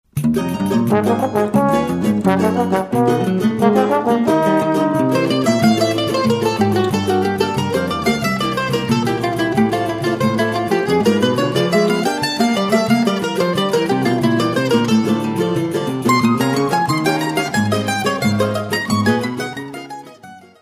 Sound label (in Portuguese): chorinho